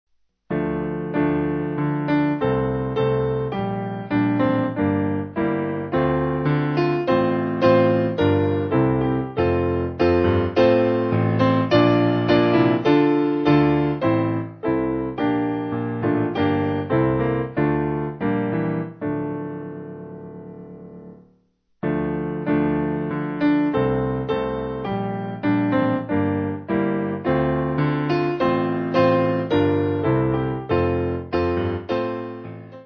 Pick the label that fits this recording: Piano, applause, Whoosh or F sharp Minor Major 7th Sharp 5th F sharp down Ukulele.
Piano